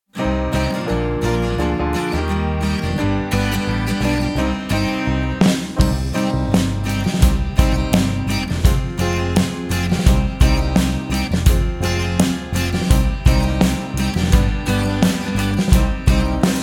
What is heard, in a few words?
Span een draad (karaoke)